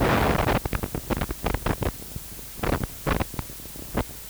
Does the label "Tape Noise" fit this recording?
Yes